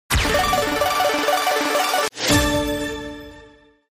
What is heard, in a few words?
Slot Msg Free Total Win